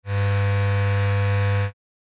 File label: Electric hair trimmer buzzing short